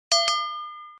start

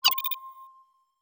ui teleport menu open

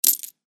other player cash out

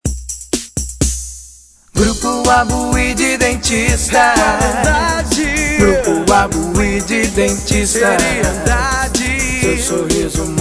jingle

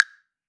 bonus letter

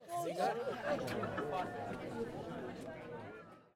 crowd Reaction jungle small Reveal; spellbound

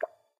msg in

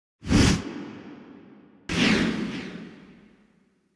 free Spin Count Down; asgardian stones

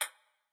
Bullet Bounce

